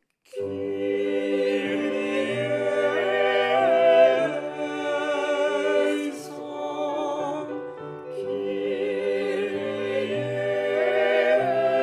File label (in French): Schubert Messe en sol Kyrie